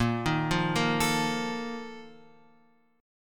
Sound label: A sharp Major 7th Suspended 4th; A#M7sus4 Chord {6 6 3 3 X 5} arpeggiated